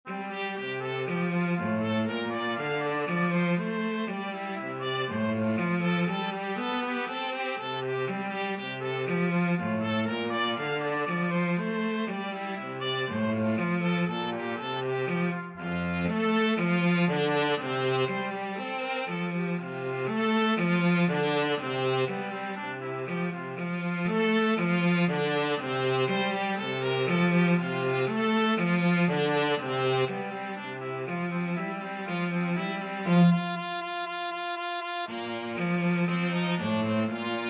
can can, trumpet cello; trumpet-cello duet; offenbach

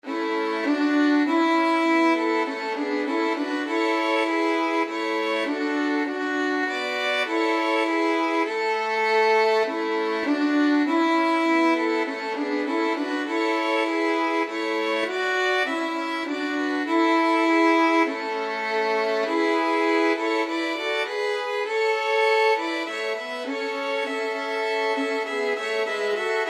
violin trio; cwm rhondda

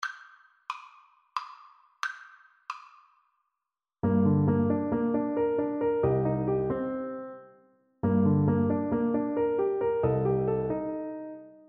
viola

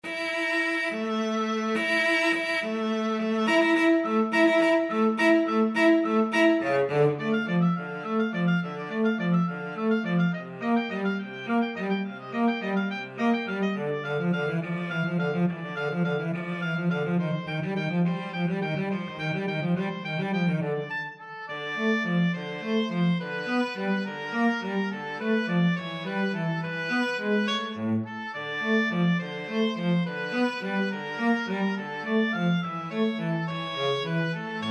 oboe cello

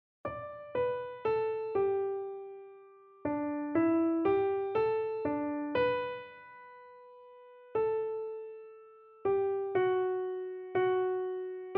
for all the saints, keyboard

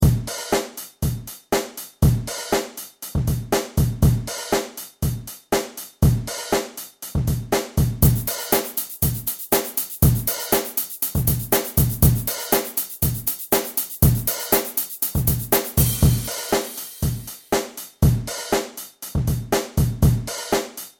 Bass Guitar

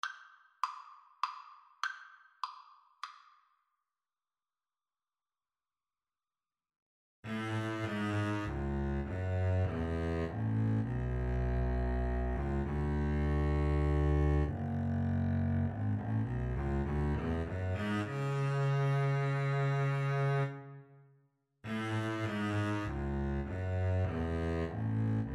Double Bass Duet

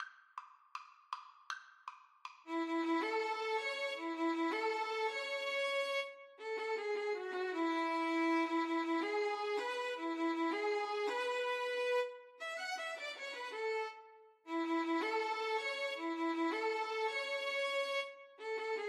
la cucaracha

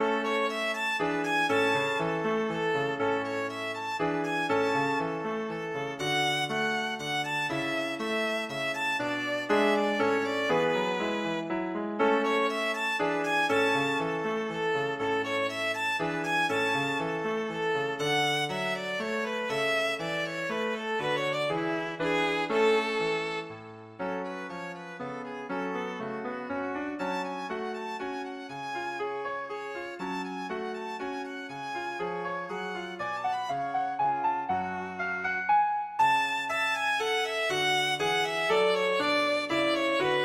minuet a, violin; bach

